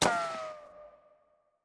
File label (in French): Pizzzz pistolet; BRUITAGES